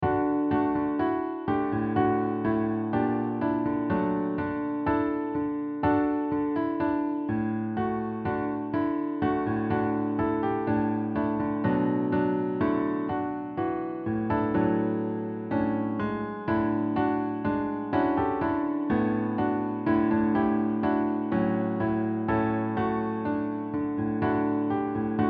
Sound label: ode to joy layered; Generated Pieces; Classical Music Composition Using State Space Models